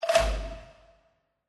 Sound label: Jackpot Display Disappear